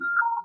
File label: intercom, computer